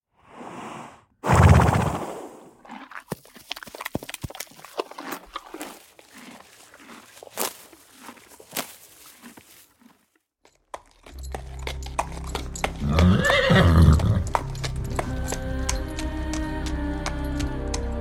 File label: Horses; BOOM Library